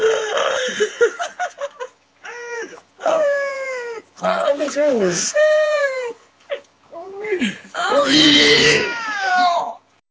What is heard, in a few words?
MAN CRYING